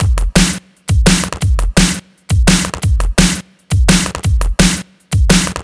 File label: Drill Beat